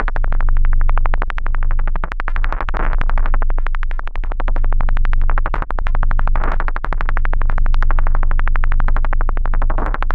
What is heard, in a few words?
kick explorations